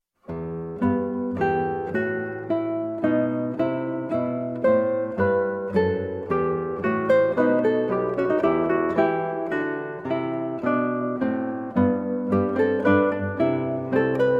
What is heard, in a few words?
Largo from Trio Sonata, Guitar; TWV 42 D4; Telemann Trios; Georg Philip Telemann